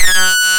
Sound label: FIX SYNC, ARP Synths; Analog Odyssey; E-MU Formula 4000 Series Vol. 3 – Analog Odyssey